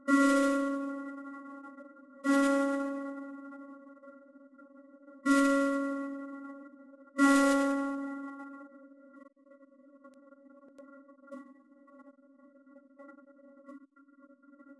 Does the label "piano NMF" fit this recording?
Yes